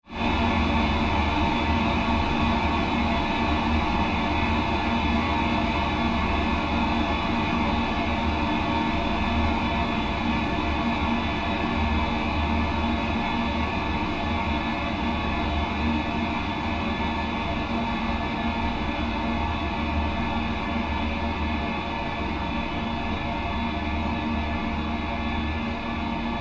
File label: Created Surge Fade Slow, Rise And Dive Sound Effects; Various sweeps, pulses and drones; Rises and Dives